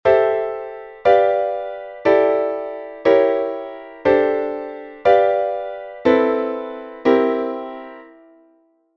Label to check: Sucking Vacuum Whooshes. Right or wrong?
Wrong